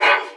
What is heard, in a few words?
throwable grunt; weapons; breadmonster